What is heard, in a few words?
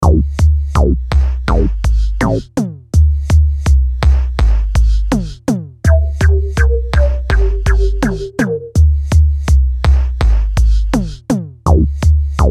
BEAT DESCEND, Beat Loop Descending Bass Synth Flute Slow Tempo; Miscellaneous Loops; Blastwave